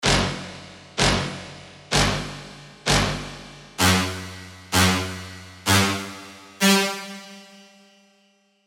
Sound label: Brass Dramatic; revealed serum 2 dirty dutch vol 1